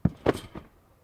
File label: trampoline realistic